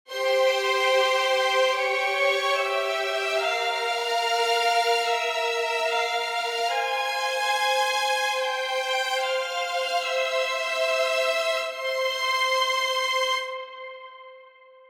strings, 145BPM

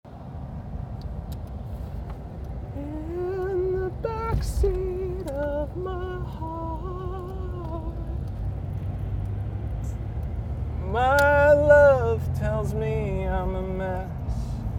Acapella

